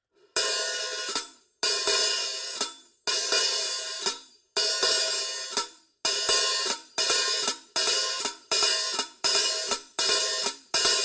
14 I Mastersound HH open close pattern, cymbal sound